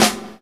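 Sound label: raw acoustic snare a key, tuned to the A note; Duration 0:01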